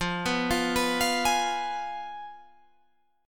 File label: F Diminished Guitar Open G